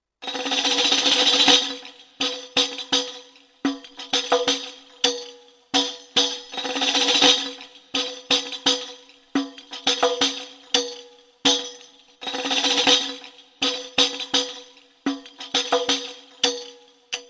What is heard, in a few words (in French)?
Tambourin